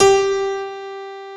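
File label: acoustic piano; Pro-Rec - Synthsonic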